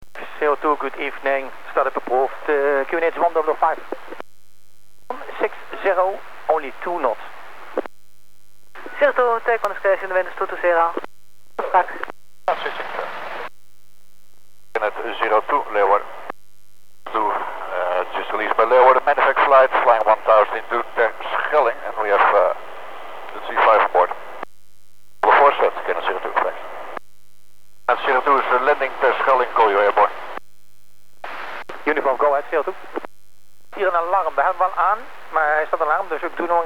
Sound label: medevac; gannet02; terschelling